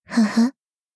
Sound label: laugh